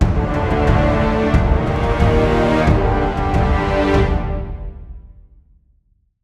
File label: Stinger; Deathbringer Saurfang